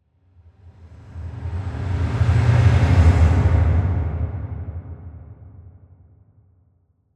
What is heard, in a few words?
Impact Reversed Distant Sounding Finale - Medium; Hollywood Edge; sound designer's toolkit v2